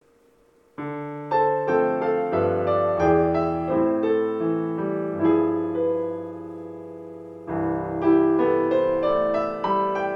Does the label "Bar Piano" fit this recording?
Yes